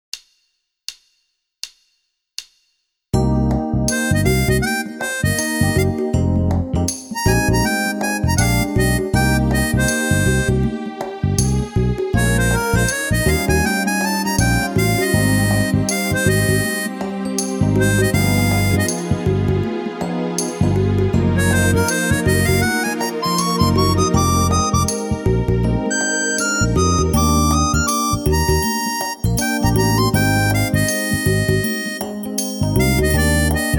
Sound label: 16th Shuffle, drums